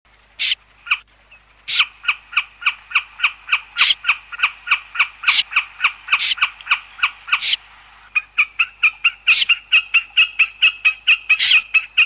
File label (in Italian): BECCACCINO BUONO; CANTI SAG NATURE 2024